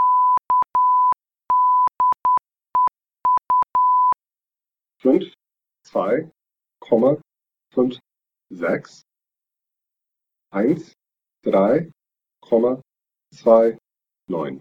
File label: echo